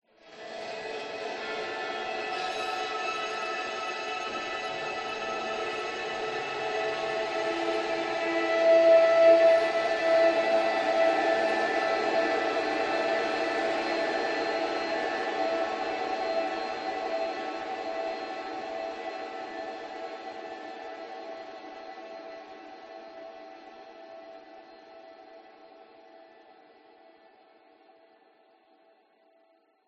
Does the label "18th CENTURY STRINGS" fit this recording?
No